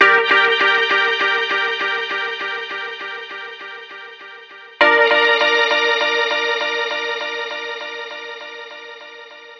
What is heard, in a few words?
100 G Gtr Pitch Stabs; Guitar; Zero-G; Future Wave